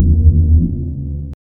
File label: compact low mid thump, synthetic